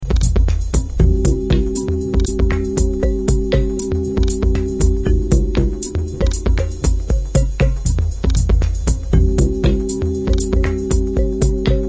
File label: cowbell